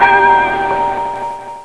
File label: SHORT BEL